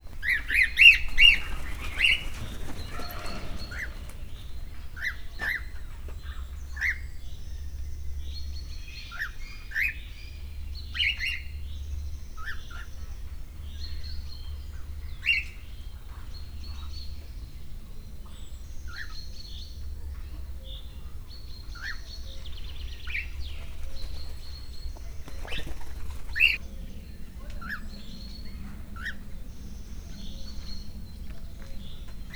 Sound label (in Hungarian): nimfapapagaj; miskolci zoo 2009